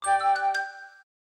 Kids instant message; Nokia Ringtones; Audio themes

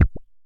kicks, Bass Drum

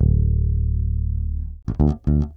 Long and Low, Bass Drum - Kicks

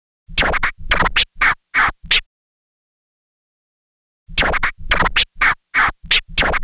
Scratch

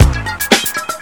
electro, audioloops

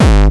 bombo, kicks, Hardcore

